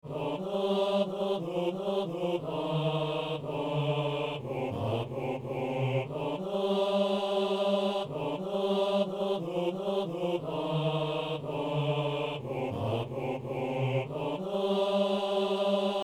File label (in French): hommes